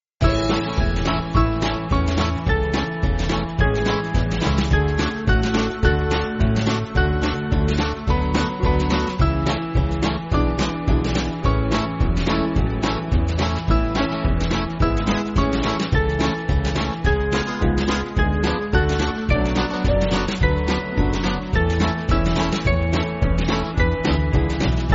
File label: Band, church music